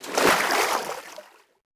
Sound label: wade, Footsteps, Water